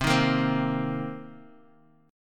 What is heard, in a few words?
C Minor 7th, Guitar; strummed; Cm7; 8,6,8,5,x,x; 4E,4A,5D,5G,5C,6F